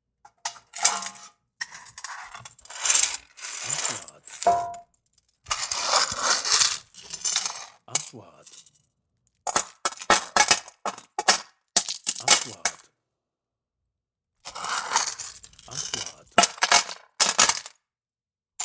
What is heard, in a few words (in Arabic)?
قطع معدنية